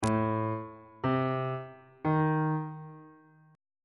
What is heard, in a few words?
c melodic minor chord 6 is a diminished on bass clef